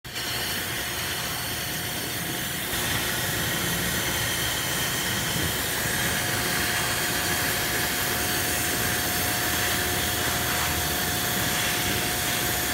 soldar

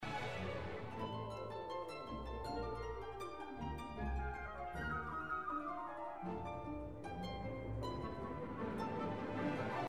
arpa

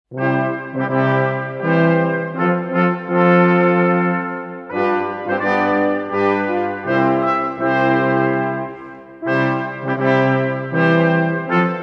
quinteto

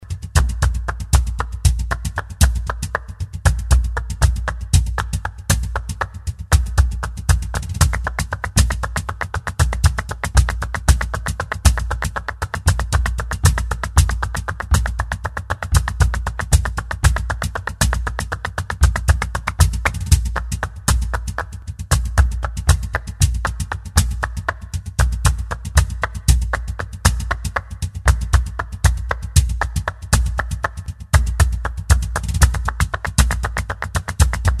cajon palmas

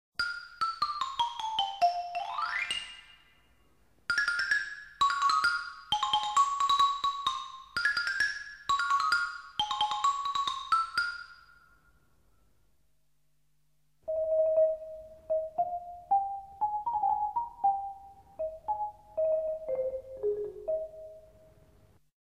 Xilófono

